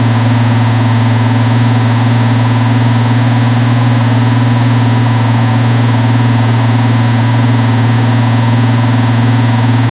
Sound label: clean low rev engine, land cruiser